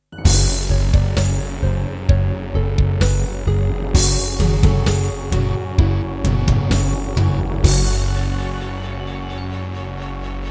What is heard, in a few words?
Anteg EasyMIDI EM-100; sigil doom episode 5